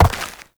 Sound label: Step Dirt; Warframe; Uriel